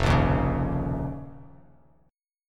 F 7th Suspended 4th Sharp 5th F down Bass Standard; F7sus4#5; strummed; JGuitar